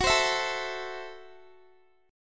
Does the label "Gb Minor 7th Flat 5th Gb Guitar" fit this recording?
Yes